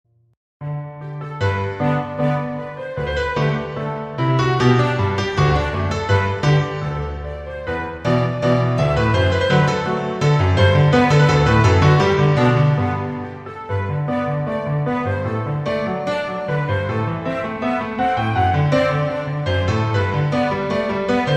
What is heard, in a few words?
Orchestral Version